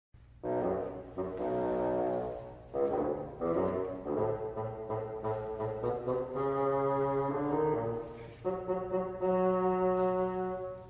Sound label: bassoon